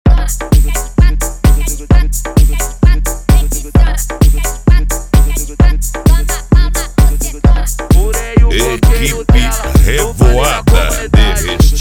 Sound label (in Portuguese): eletrofunk; cd equipe revoada especial fim de ano